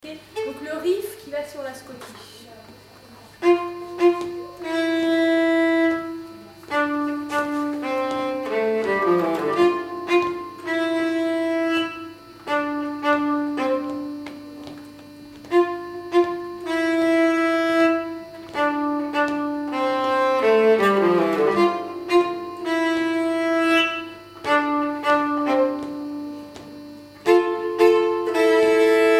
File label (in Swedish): schottis, nyckelharpa